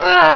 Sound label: pain